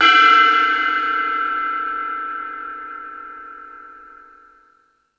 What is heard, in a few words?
Thundadome, E-MU Pro-Cussion